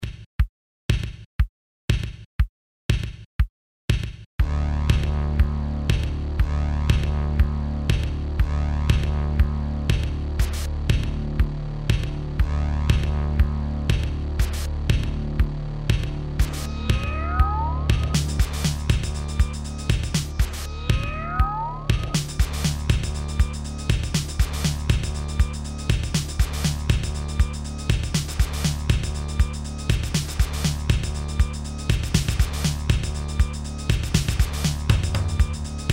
drm1; synched sequencer; internal tempo (from 40 to 250 BPM) can be set by TAP tempo or synched to external Midi clock; NEC 8 bits CPU is able to manage 8 voices

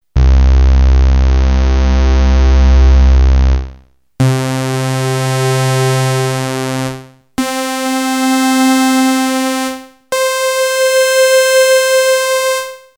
waveshaping, redsound darkstar xp2; TEST: OSC progressive waveshape

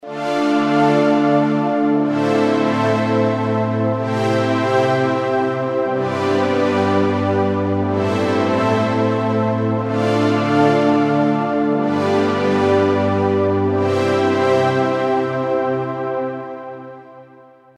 pad pan, yamaha sy99; LFO PAN: 2 extra-oscillators used for panning motion and effects modulation for both AWM AFM.; synth

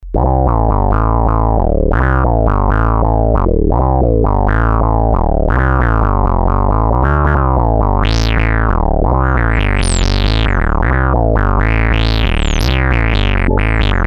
bassy, Novation Bass Station II; bass boring; analog monophonic machine with subtractive synthesis

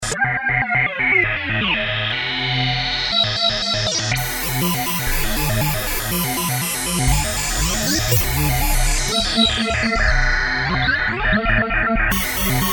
Synth: weird LFO, Emu Xtreme Lead-1

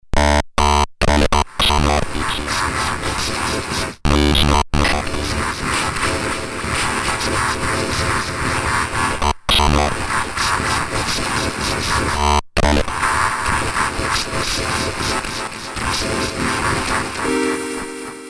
pitch, roland sde330; ptch shifter 1 spectrum

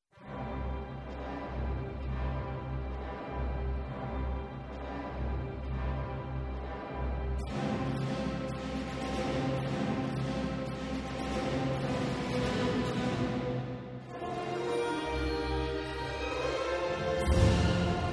orchestral, gem s2, synth

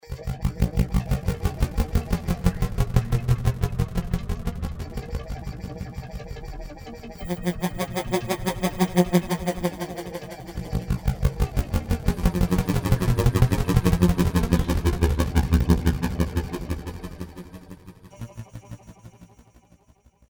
synth gated, roland jd800

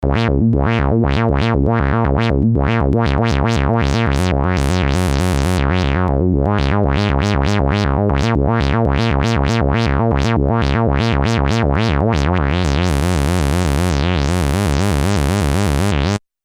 squelchy sound; MFB nanozwerg

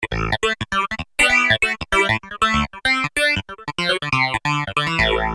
clavinet, roland jv2080; Clavinet: one

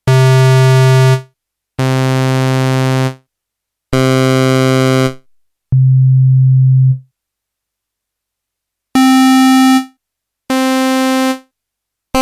test internal tones, akai s2000

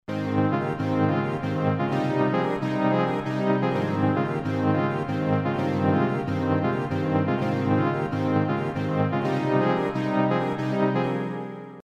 inverse, Yamaha TG33

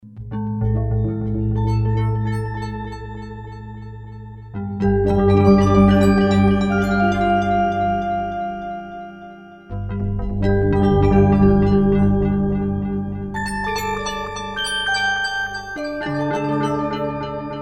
synth harp space, yamaha sy99

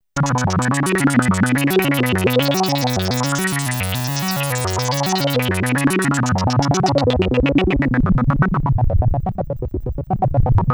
synth c64, Polivoks; Polivoks formanta

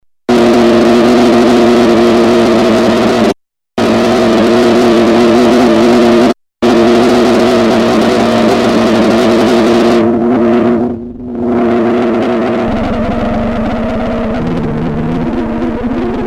fx bees; Creamware minimax

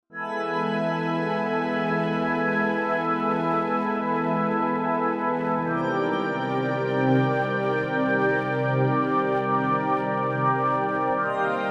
pad, Roland JV880; goodending

